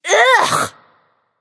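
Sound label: ugh, female; smite; voicepack; hera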